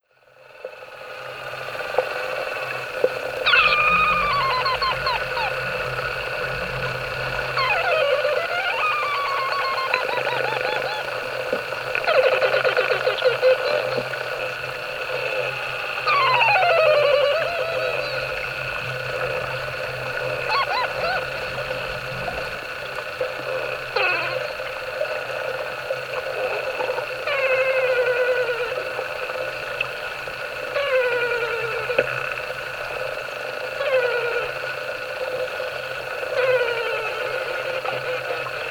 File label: subaqua underwater soundscape high life; donatus; klaus osterwald